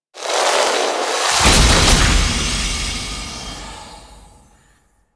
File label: Jetpack Equip; Warframe; DE; Vehicles; Veilbreaker